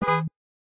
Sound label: Retro Car Horn, notifications; Samsung Galaxy S25 Ultra